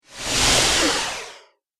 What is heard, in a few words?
Teleport Warp Video Game